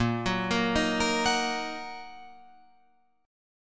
Bb Suspended 4th Sharp 5th Bb down Guitar Open F; arpeggiated

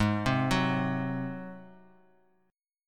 G sharp 7th G sharp; G#7; arpeggiated; x,11,10,11; JGuitar

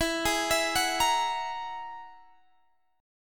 Ab 7th Suspended 2nd Sharp 5th, E

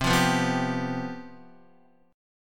C Major 7th Suspended 4th C down Guitar; strummed

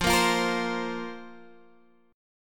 F Suspended 4th F; Fsus4 strummed; x,8,8,10,11,8; JGuitar

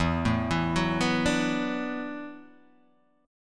E 9th Suspended 4th E down Guitar Open D

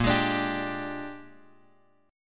A sharp 13th chord, Bass Standard; strummed; JGuitar

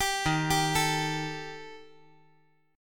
Eb Major Flat 5th Eb; EbMb5 arpeggiated; 12,15,15,12; JGuitar

